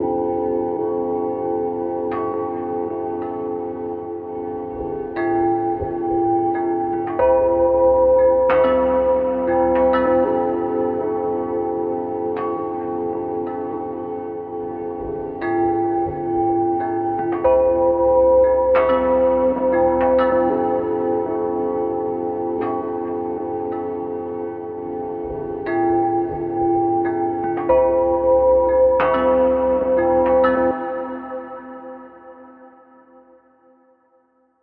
Chimes From Beneath

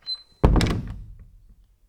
Door Close

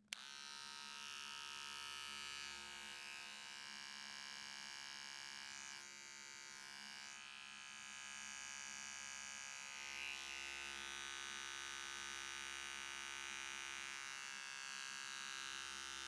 Electric Razor

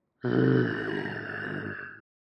Growl

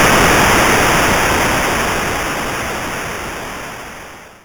Retro Explosion

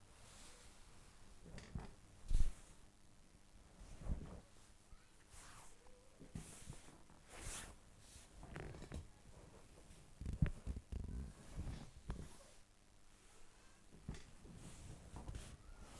Sitting Down

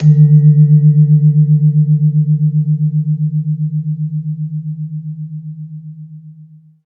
Jegog

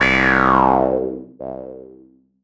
Blip Random; Spankmyfilth

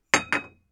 Putting Pot On Plate

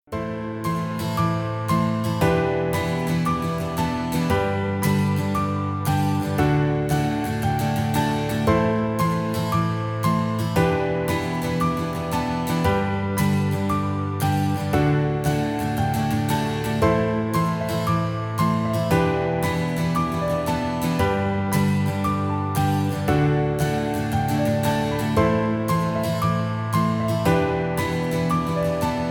Inspiring And Uplifting Acoustics

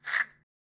Cement Scrape Short

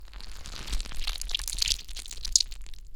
Slime

